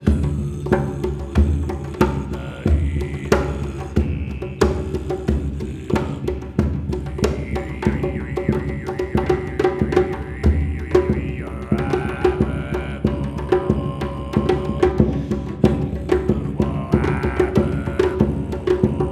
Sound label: Shamanic Drums